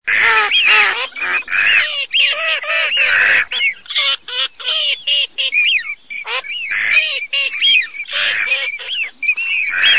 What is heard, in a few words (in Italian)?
GERM ALZA FISCHIO